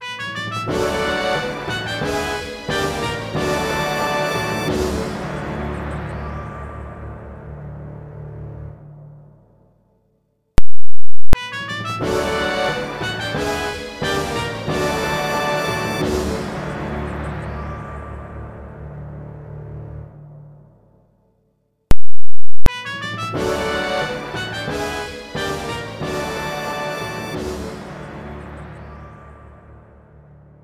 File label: Cup Win; super mario strikers gamecube; Flower Cup